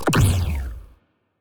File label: weapon debuff hit; Stellaris; biogenesis; weapons